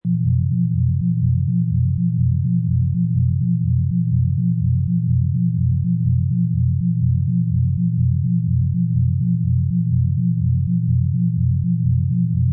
Space Scifi Sound The Lab Long, Sci-fi ambient atmosphere; Pulsating hum. Spaceship, spacecraft, space station; Lynne Music